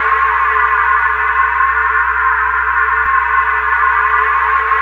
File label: CYCLONE, CYCLODRONE; Spectrasonic Distorted Reality 1